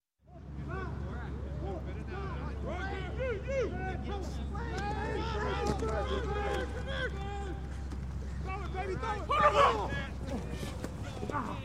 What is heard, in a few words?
Football Practice; Sound Ideas 2000 Series